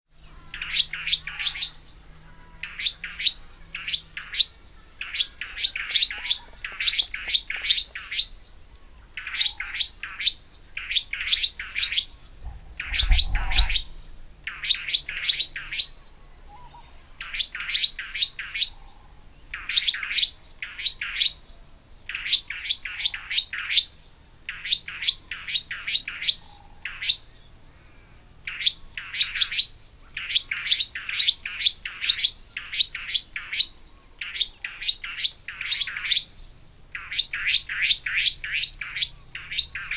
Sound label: Myiagra Inquieta; Restless Flycatcher; Call